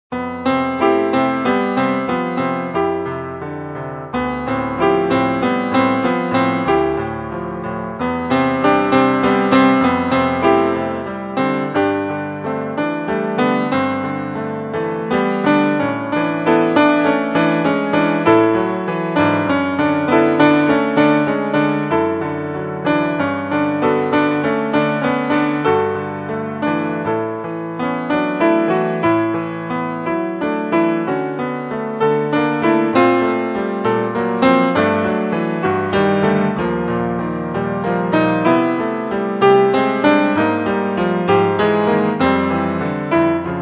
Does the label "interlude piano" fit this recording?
Yes